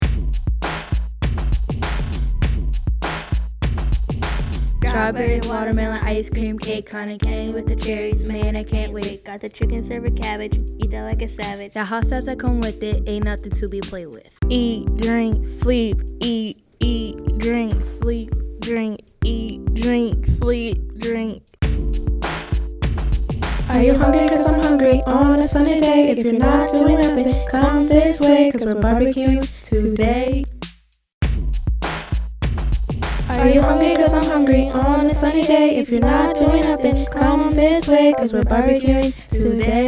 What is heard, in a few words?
Food Rap